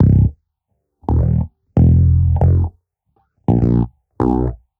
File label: Bassline 100 C, hiphop, 100bpm, Bass; musicradar